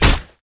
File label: sword